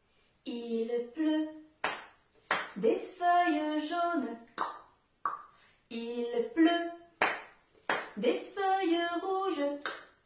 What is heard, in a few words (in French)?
Comptine Automne